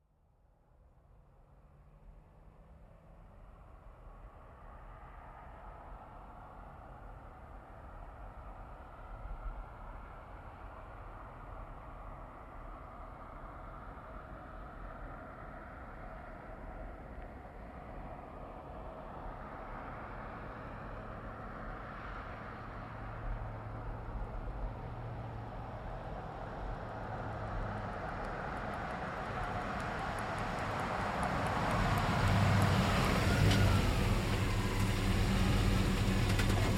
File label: Truck GMC Savana Up Stop Med; Trucks; Vehicles; 2010 GMC Savana; BigRoomSound